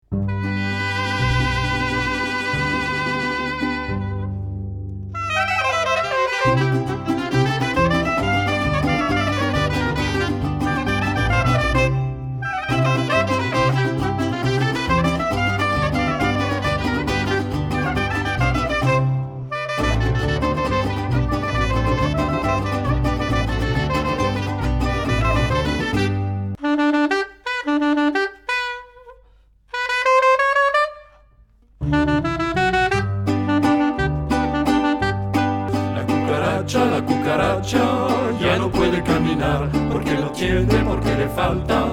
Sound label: Mariachi Medley